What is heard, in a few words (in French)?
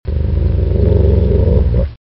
Grogne, Animaux; bruitage